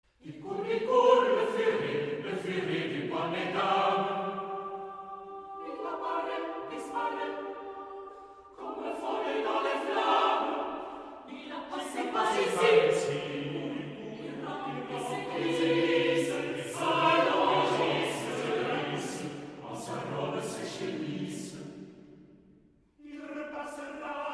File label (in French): musique pour choeur; I. le furet